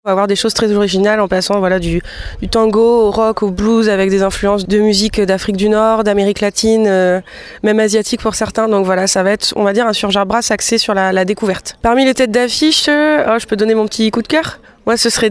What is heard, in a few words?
brass festival